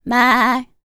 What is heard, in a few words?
Vocal, R&B & Dance, FEM. HUMAN